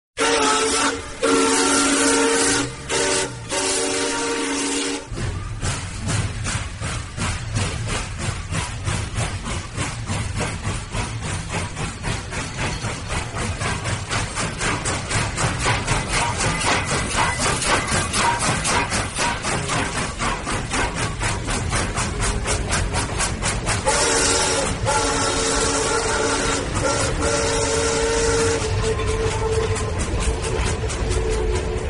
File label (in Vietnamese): tiếng còi tàu hỏa